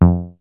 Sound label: DISTORTION BASS